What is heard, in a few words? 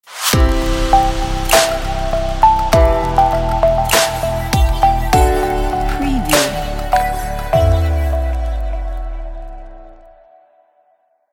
elegant logo signature